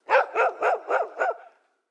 Ambience Menu Dog Barking; Subway Surfers City; Android, iOS

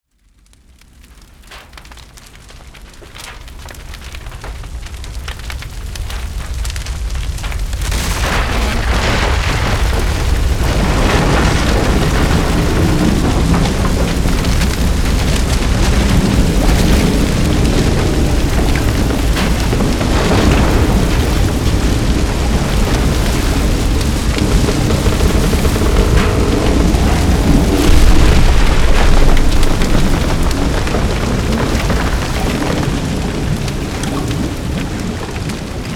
ship sinking

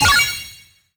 Bonus Balloon

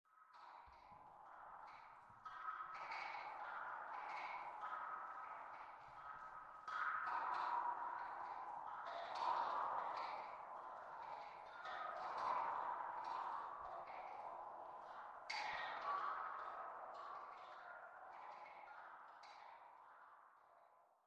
Factory Ambience; Lethal Company

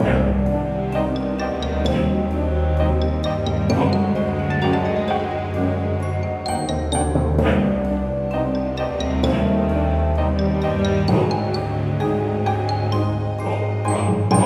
Ruins Working 130bpm; Don't Starve Giant Edition; PS Vita

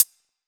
BGM DRUM

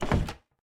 Open Door Wooden; lethal company

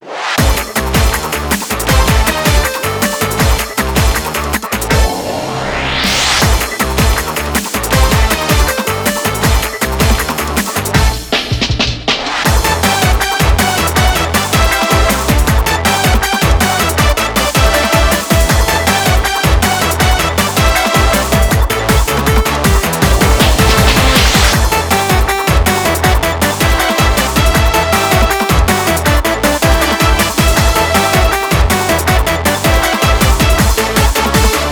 Bgm Bomb

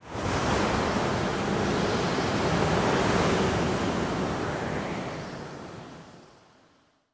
Wind

